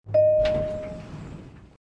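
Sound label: Elevator signal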